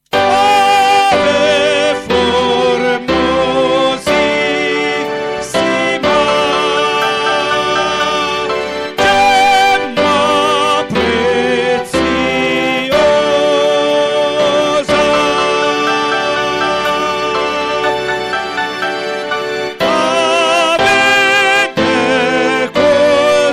soprani; Carmina

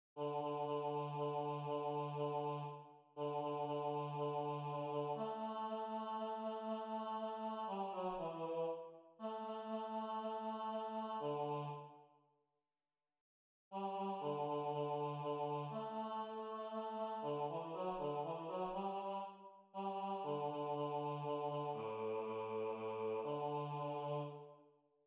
Basso; Natale

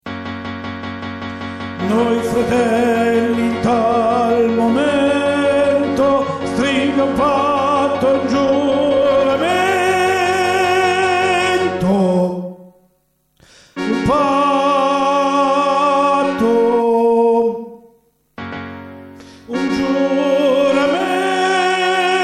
tenori; Si ridesti il Leon di Castiglia

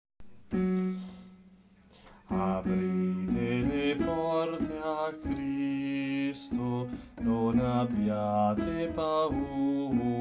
soprani; Coro Isola del Giglio

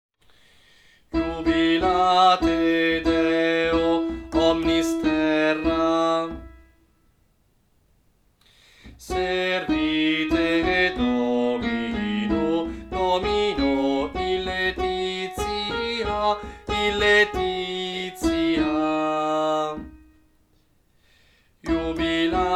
contralti, coro; Coro Isola del Giglio; Natale; Jubilate Deo

